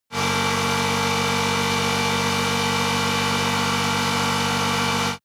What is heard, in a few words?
motorcycle engine high constant rpm ascending; motorraeder; verkehr transport